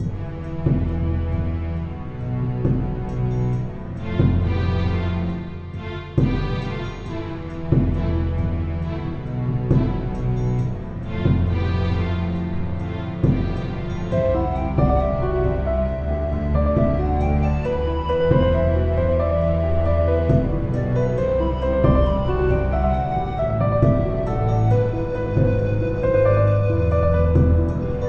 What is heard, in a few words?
BGM Outdoors